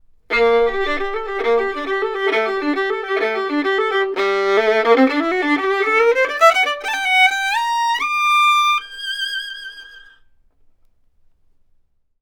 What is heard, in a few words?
VIOLIN FET